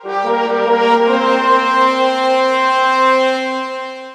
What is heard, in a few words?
Fanfare Jingle